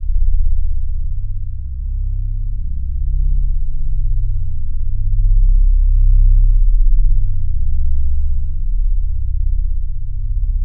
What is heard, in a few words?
Bass; 808s